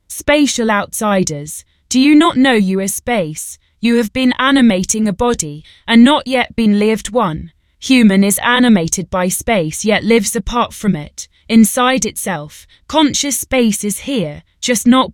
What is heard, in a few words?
E♭ major bpm 60